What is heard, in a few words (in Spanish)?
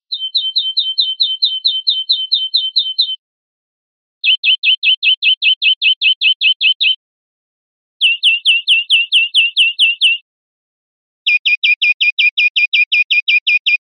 chones corridos golpeados, verderon